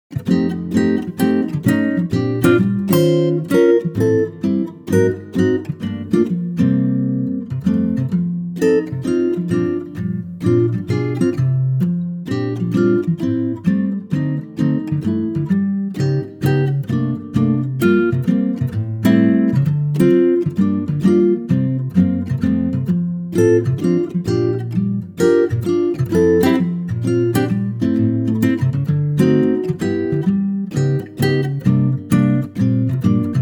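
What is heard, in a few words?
acoustic guitar F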